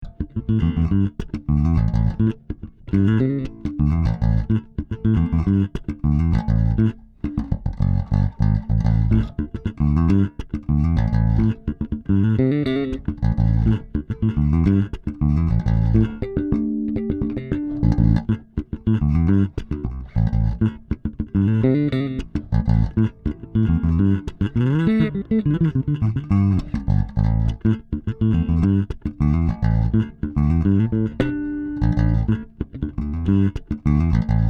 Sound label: Fodera Double, basses; fodera5; actif